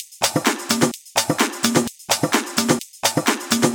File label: Minimal Loops